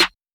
Snare

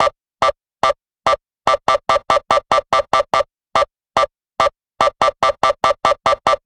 fx loop dj 144

